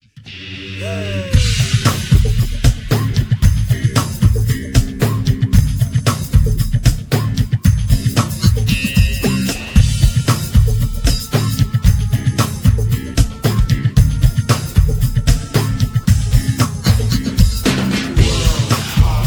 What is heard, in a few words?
jungle trance